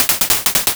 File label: triangle